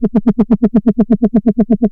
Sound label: Analog Lfo Looped